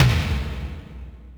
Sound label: Yamaha RM 50 Toms